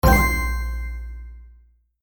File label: match; Dora and the Lost City of Gold - Jungle Match